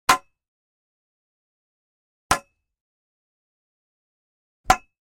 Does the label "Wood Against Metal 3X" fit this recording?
Yes